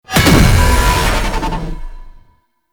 Medic Aoe Wave Impact; Abilities; Warframe; Tau; Dax Medic

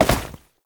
Step Snow; Warframe; Oraxia; Foley

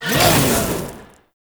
Power Slide Start, Motorcycle; Warframe; Motorcycle Ninety Nine

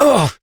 Pain, Adult Operator; New War

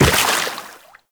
Tenno Run Water, Footsteps; Warframe

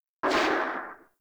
Infested Vomit Gun Tail; Warframe

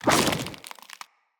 Root Spider Mother Foley Leg Swipe

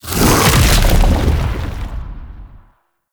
Infested Splitter Attack Slam; Warframe; Grey Strain

